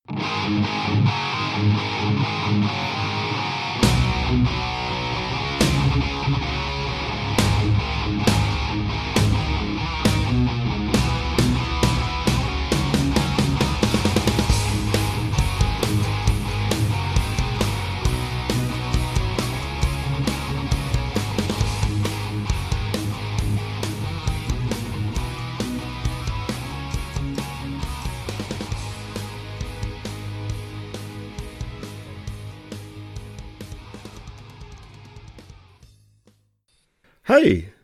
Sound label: Jingle; harte toene